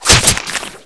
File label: knife stab; weapons